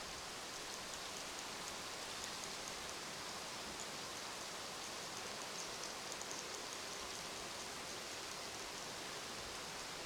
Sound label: Malaysia, Exterior, Atmosphere, Cave in Niah, Waterfall, Bats, Swiftlets; ambiance